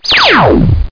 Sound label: Laser